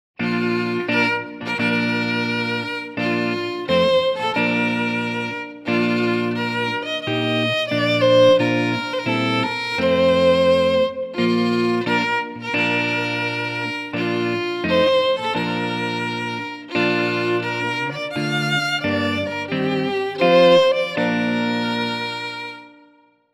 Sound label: bridal chorus Guitar; wedding music; Sarasota, FL